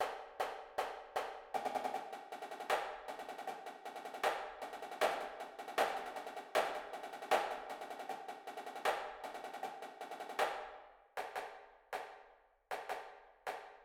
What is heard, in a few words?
snares, drumline